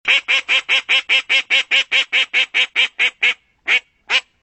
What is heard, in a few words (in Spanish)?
pato mignon